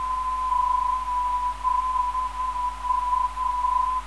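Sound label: ZW 418kHz, non-directional beacon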